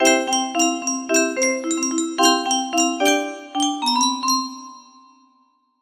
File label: annoying ringtone